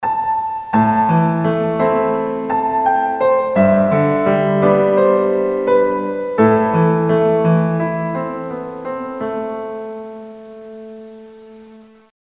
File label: death